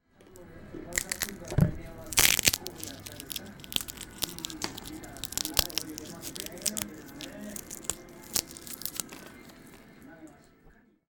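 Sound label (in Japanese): piinattsu no kawa wo muku; ピーナッツのかわをむく音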